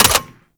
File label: Sniper Mech React; DE; Warframe; Warframes; Frumentarius; ExaltedSniper